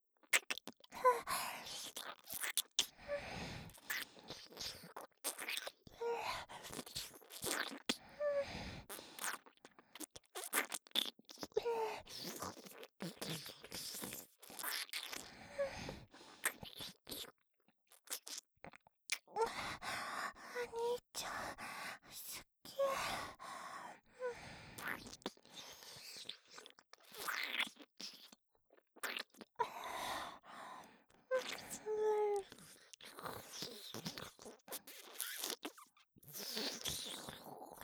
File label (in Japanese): キス; 妹達とのキスシーン集